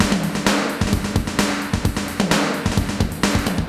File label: Spring Verb HR-16B (130BPM), cyborg beats; musicradar